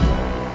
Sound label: Bwoww